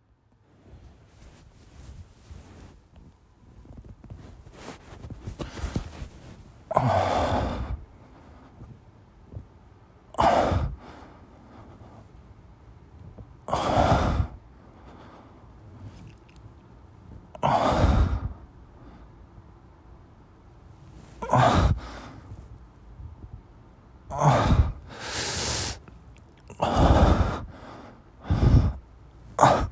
リアルな自慰中の吐息と喘ぎ声

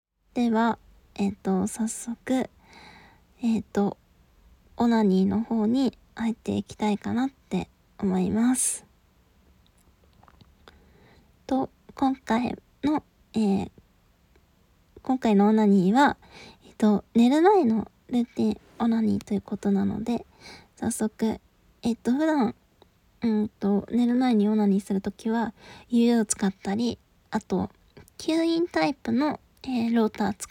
実演オナニー

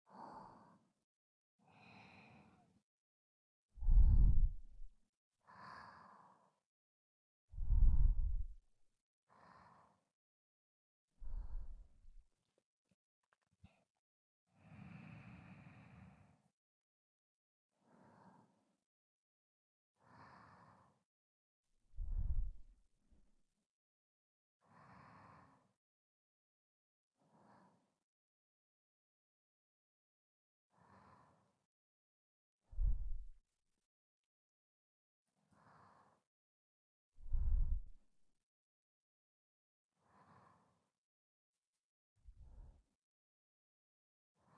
添い寝（寝息トラック）

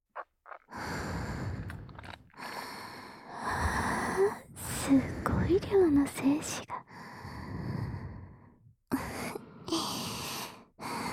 ぐちゅぐちゅ おちんちん マッサージ, ASMR

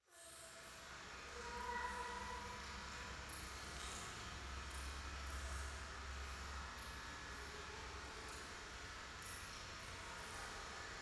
廃墟 イラマチオ 雨

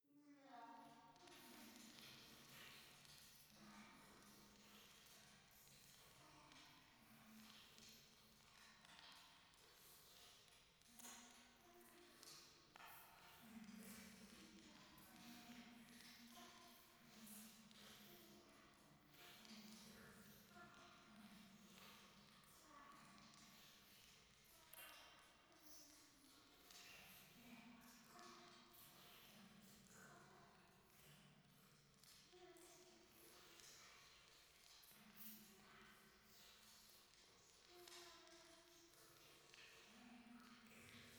夢の中 フェラチオ 暖炉

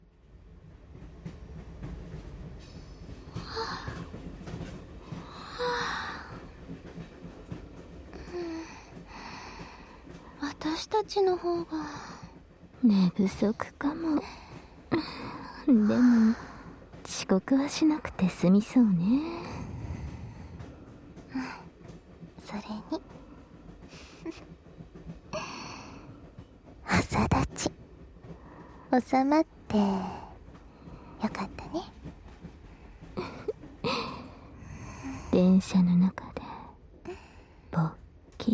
反転 電車の中で両耳舐め

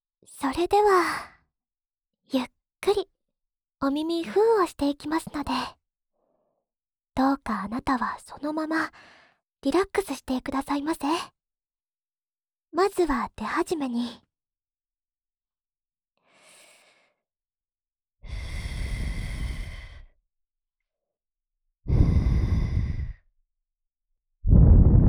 吐息いっぱいお耳ふーふー(右), ASMR

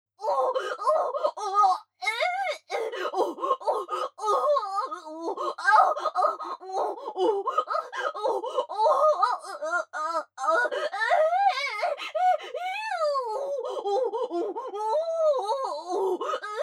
喘ぎ 通常; A-09 ふたなりバック・喘ぎ・通常; アスティリア

